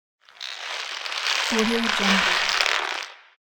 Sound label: monster scrape